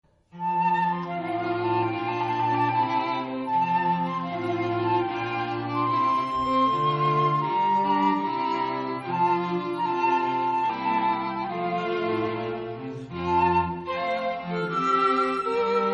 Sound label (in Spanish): air mus del agua fl cuerdas; Air (Mús. del Agua) – Haendel; LA PAZ